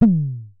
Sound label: Synare Filter; Star Instruments Synare 3